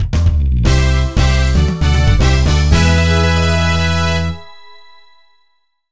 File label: Success; Quiz Hexagon II; DS gamerip 2010